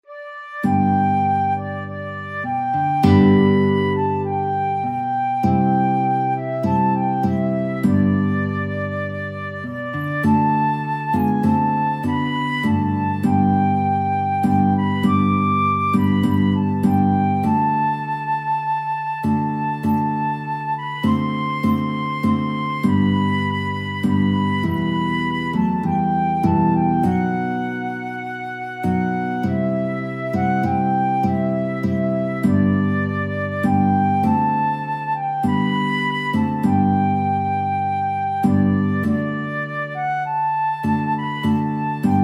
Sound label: guitar flute duet